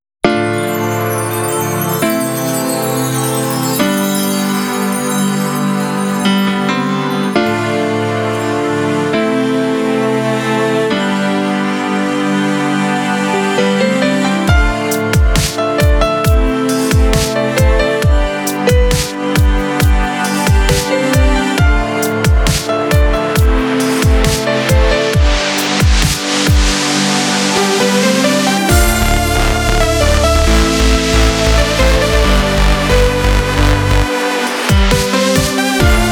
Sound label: Inspiring Fashion Dubstep; Soundatelier